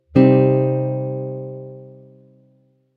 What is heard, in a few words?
minor 5th